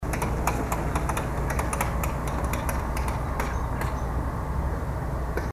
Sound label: Some found percussion